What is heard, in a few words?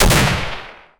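ak 47 rifle fire; 05 second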